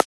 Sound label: Transient; FLPRO